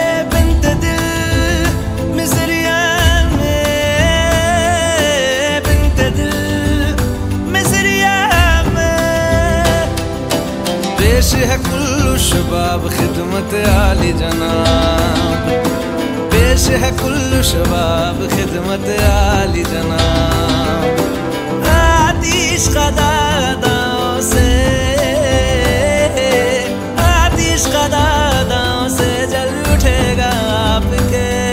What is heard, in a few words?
Bollywood Song Ringtone; Latest Bollywood Movie Padmaavat